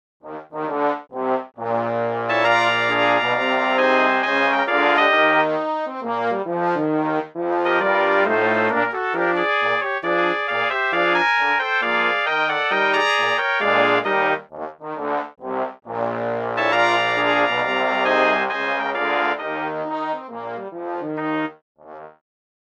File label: george street stomp brass arr